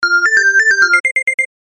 Whistle; Senseit E500

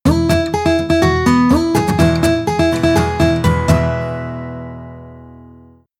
Stereo Lenovo Guitar, ringtones; ZUI 13

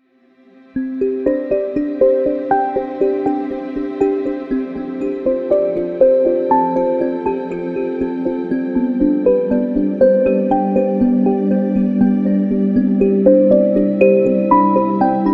weather alarm cloud; OnePlus; ui; OnePlus 11

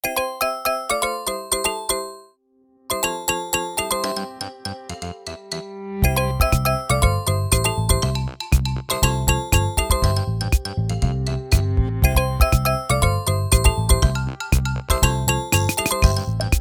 Kalimbells, alarms; Infinix; NOTE 30

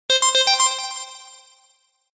Mosquito bite; Sony Ericsson G700

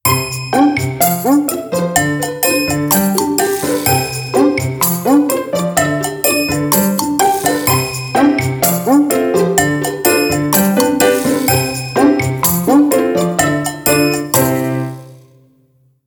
Ringtone; OnePlus; One China

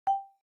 Video Record; ZTE Axon 20 5G; ui

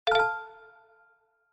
Message, notifications

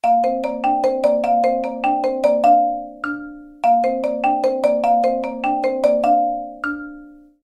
Swing, alarms

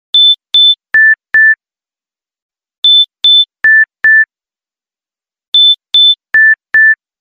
Step Beep, Ringtones; KE970 Shine; LG

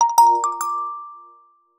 Nokia Message

